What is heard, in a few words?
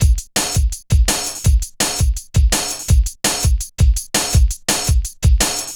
Programmed Stepz, Jungle Drum Loops